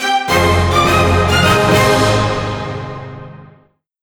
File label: Weapon Level Up!